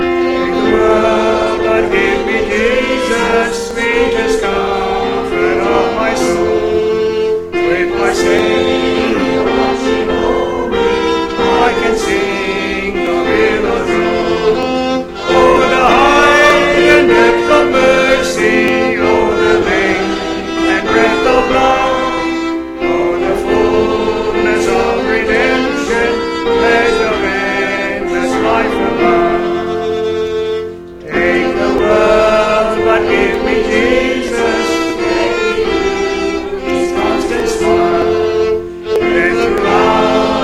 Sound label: duet congregation